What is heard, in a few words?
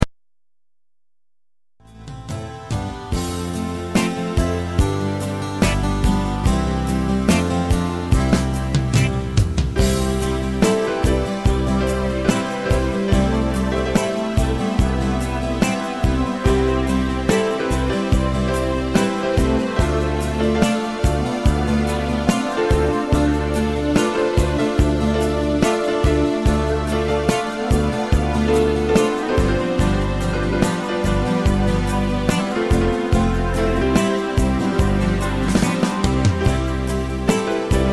Piano